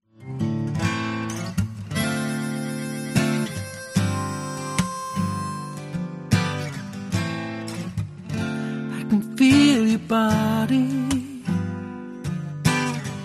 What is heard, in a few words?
acoustic